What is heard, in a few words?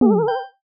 speech bubble spring